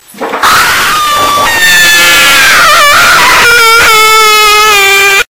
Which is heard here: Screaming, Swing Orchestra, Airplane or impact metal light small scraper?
Screaming